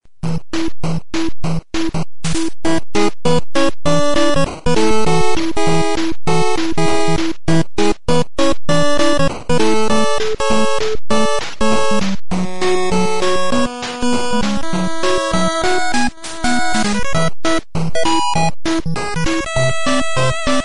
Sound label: classic console cadence; Duck Tales